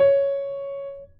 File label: long C#6